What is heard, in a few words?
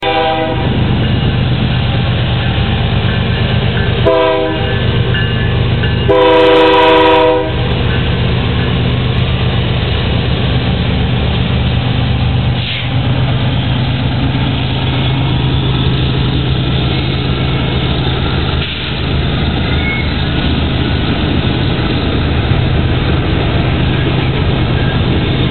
Bnsf Train Horn